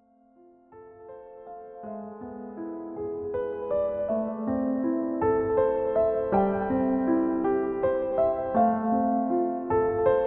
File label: lullaby